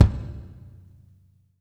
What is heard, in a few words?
GATED VER, KICK DRUM; Sampleheads; New York City Drumworks VOL-1; SP KICK DRUM